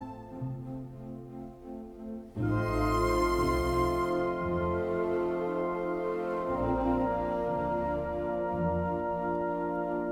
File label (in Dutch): Andante ( pianoconcert ); Mozart